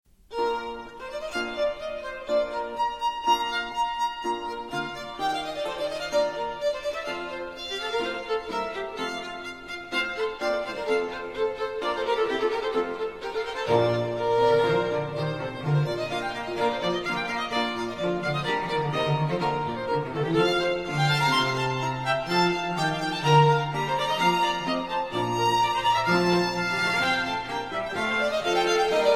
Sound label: sonata a tre c major allegro